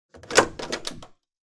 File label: opening door